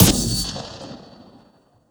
Sniper Shot

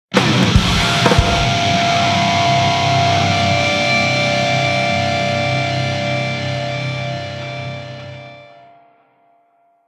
Punk Ending

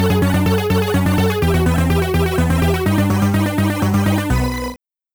Cathedral Game Over; batman; amiga; Gotham City

